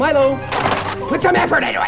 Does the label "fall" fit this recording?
Yes